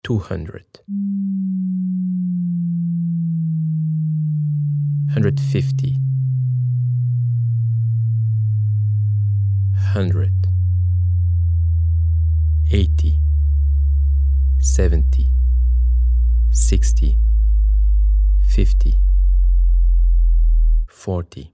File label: bass localization Right